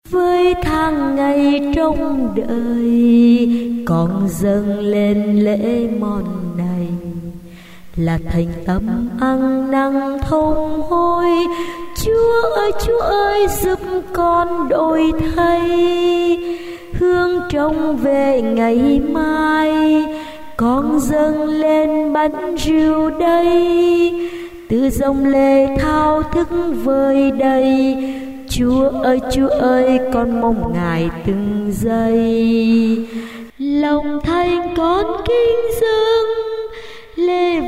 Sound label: tenor basso